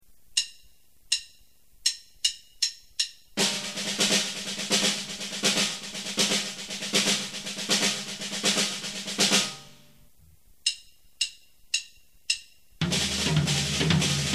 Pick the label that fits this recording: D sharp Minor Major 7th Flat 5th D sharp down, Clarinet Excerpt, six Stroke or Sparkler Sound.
six Stroke